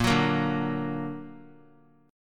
Bb Augmented Bb; strummed; JGuitar

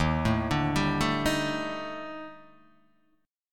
Eb Major 7th Flat 5th, Guitar Open D; 1,0,0,1,0,1; down

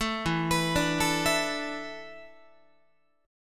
E 7th Suspended 4th, Guitar; E7sus4; arpeggiated

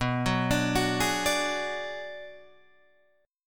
B Minor 6th B Guitar Drop D, chord

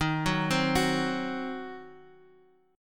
Eb Minor 6th, down, Guitar, Half Step Down

